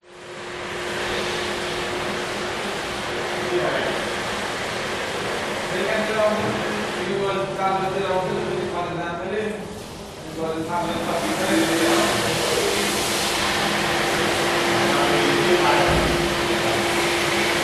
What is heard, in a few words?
public places public toilet mall 5 or so people talk hand dryers operate toilet; flushes